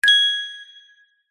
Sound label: magic wand ping; zapsplat